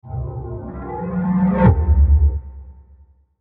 Whoosh Low Short Reverb To Hit, Whoosh Mystery Dark Metallic; Blastwave